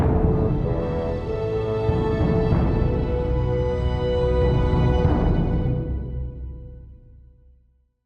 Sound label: Stinger; Toy Captain Tarim